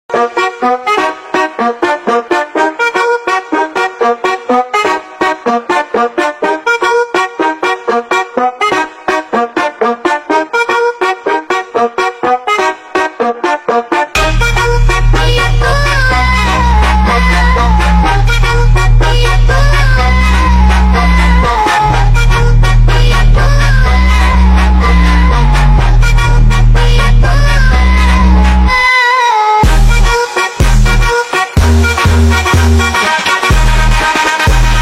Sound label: Brazilian Funk Remix Phonk